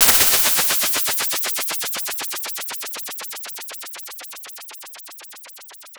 impulse response 8 Hz sine

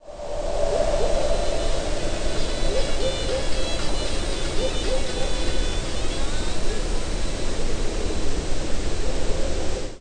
Arabic Music

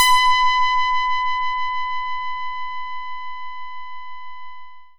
beating

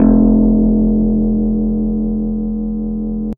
ebass pspice

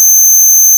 post eq ideal constant filter

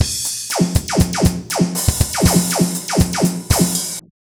drum snare

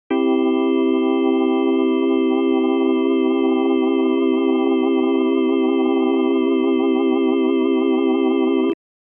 long chord leslie slow to fast, hammond

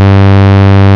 phase distortion constant parameter

